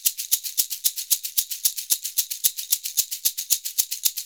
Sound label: SHK, Shaker; Univers Sons; Basicussions